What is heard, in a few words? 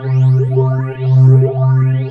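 Ambience Sci-fi Energy Loop; SFX Kit; Sci-Fi Loops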